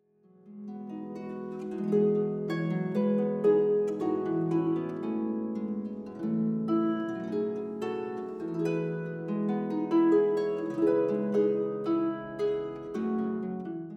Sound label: Harp